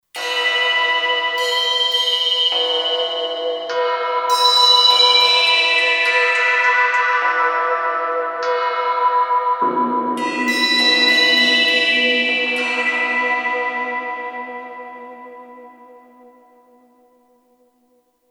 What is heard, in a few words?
cymbal thing, Kurzweil K2500X; Factory Programs